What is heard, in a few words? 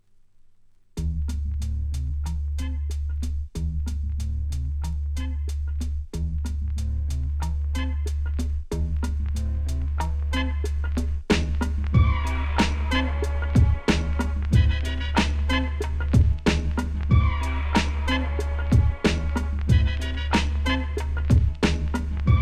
Instrumental